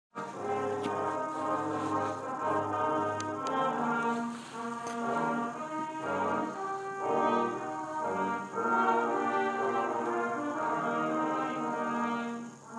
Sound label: a snippet of the brass ensemble